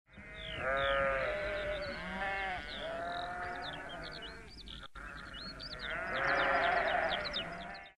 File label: animals